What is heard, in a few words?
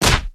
human hit